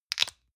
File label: lotion lid open hard; friseur; berufe